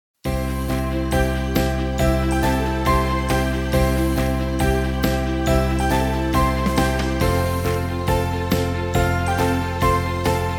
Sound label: Motivational March Background Music